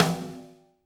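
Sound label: snare drum, Drums; Bob Clearmountain Drums I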